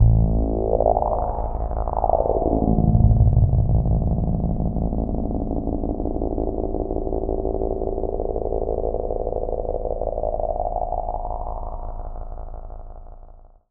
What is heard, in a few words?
SPACE PAD 140 A; SpaceSounds